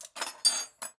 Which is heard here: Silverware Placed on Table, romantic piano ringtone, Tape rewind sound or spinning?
Silverware Placed on Table